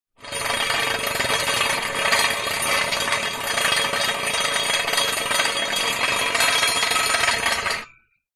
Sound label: household rake metal drag concrete